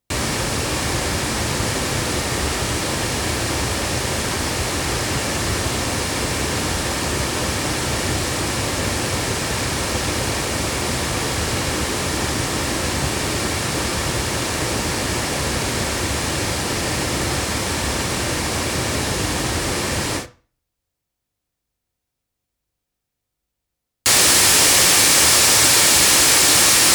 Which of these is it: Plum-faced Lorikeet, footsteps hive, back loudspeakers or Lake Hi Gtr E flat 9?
back loudspeakers